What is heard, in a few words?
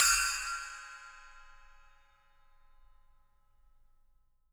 6 SPL 3, FX CYMBALS, Living Drums; Sampleheads; Peter Erskine Living Drums